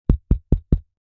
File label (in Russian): zvuki postukivaniia paltsem po mikrofonu